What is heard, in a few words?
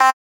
Blip C; Noises; Synthetic's Serum Collection